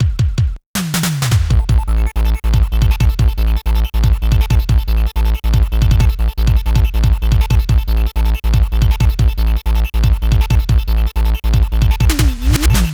Bgm

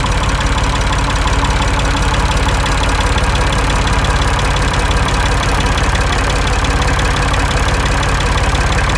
SFX - TRACTOR1; Jeff Wayne's The War of the Worlds

